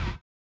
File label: collision; Spectrasonics; Metamorphosis